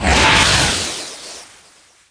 draco hit; DAoC; Toa; Gamestar

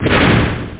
transform; ArTKanoid

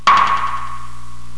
Sonar Ping; Olduvai Sounds

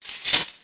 sheathe; DAoC

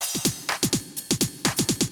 drum loop house 125; eJay

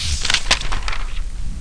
page turn

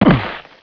land; outlaws

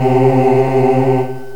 Choir Aahs; ensemble

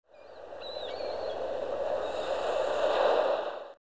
gulls

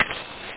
match strike!; charley cat mouster mind

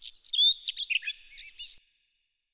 Forest bird; ImpGal2; colony

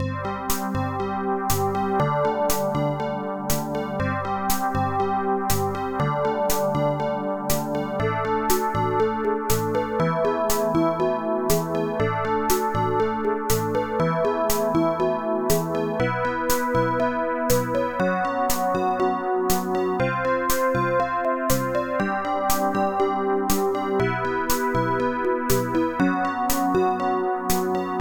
happy; nostalji

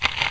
eat, dino; Dino Crisis

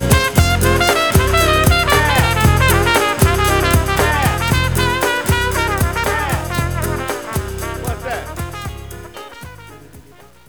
jazz it up exit windows; PLUS! Themes

